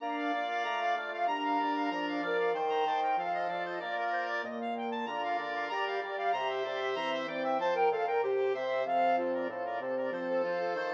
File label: wind 5tet